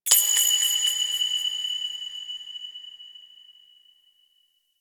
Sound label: Hand Compass Objective Notification; Ambience; Duviri; Warframe